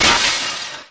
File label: jewel break